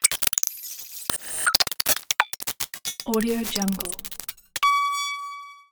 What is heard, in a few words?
glitch interface; soundmorph